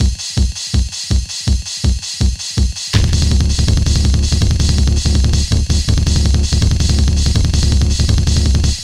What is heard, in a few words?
KICKS, Tekkno LOOPS 150; GET YOUR KICKS; Masterbits - Tekkno Trax